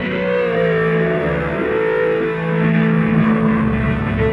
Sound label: Monster Drop, Electric Guitar; Sonic Foundry (Sony Creative Software); Friendly Fire; Wrath